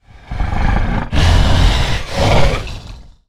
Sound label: Exertion Light Breaths; Evolve; Goliath